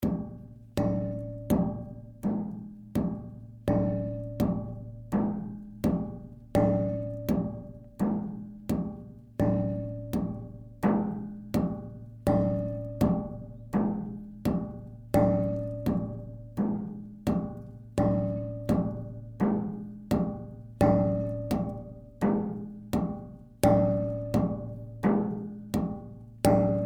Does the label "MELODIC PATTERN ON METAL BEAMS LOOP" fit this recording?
Yes